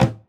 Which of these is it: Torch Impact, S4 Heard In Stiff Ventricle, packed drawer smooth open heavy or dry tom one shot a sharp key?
dry tom one shot a sharp key